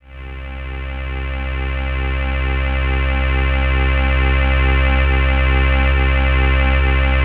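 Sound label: PAD C3 left; USB Soundscan vol.05 - Explosive Jungle; AKAI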